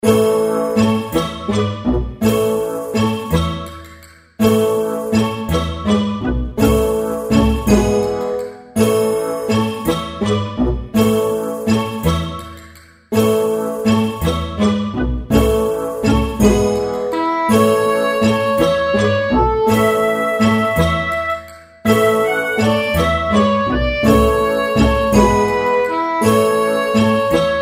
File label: reelspin fg; Pinocchio